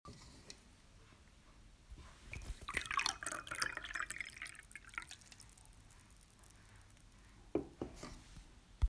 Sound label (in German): Geräusch